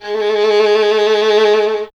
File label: STR VLN, Solo Instruments; Miroslav Vitous